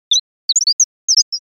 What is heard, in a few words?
chirp mix